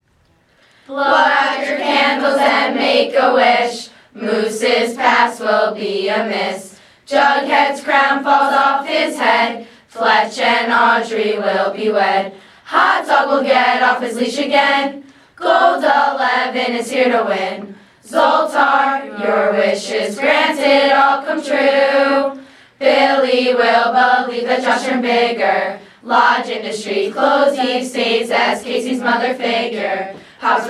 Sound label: Cheer, campsongs